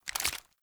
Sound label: Pistol Reload Start; Warframe; Tenno; Lasrian